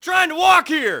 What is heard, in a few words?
yell; Matt Hoffman's Pro BMX 2; XBOX